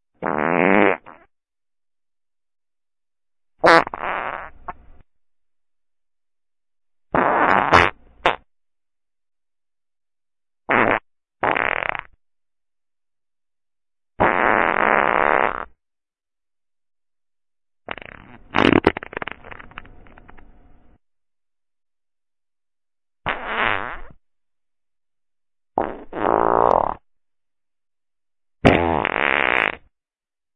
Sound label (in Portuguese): Barulho De Peido; Vinhetas